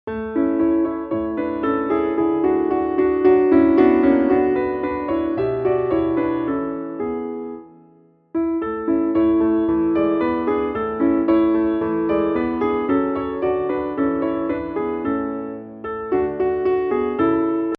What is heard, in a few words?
medium tempo piano accompaniment; Mozart; Crudel perche finora